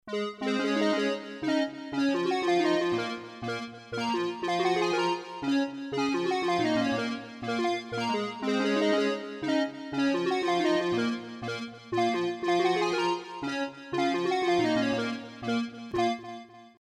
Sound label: synth sqr, yamaha sy55